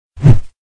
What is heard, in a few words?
Break Wind